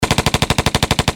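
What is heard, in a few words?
automatic gunfire burst, sounds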